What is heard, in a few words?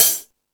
High Hat, E-MU Pro-Cussion